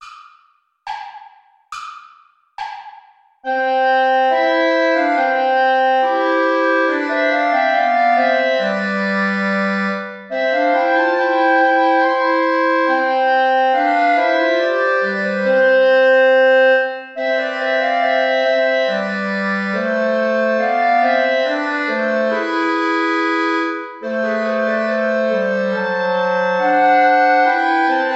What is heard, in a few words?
play along without clarinet; haendel georg friedrich; zion daughter rejoice